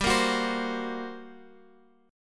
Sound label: G Minor Major 7th Double Flat 5th, G, Guitar, Open F; GmM7bb5; strummed; 19,17,18,19,x,x